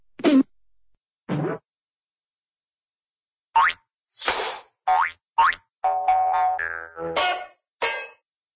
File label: boink, doink boinks; cartoon sound fx; pixie and dixie; hannah-barbera